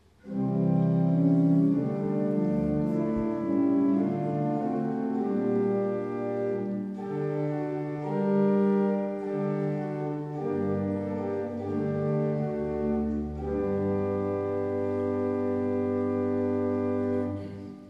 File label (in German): vorhalt